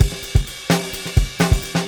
Fusion 128, Beat Loops; Zero-G; Monster Beats

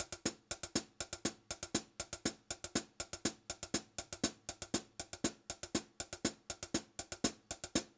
FUNKY, Funk Groove Drumloops

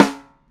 Open Ring B, Dimensional Snare; Airwindows

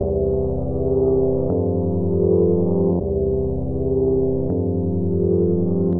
Organ, Drum & Bass Keys; Sonic Foundry (Sony Creative Software); Knutrix Drum & Bass

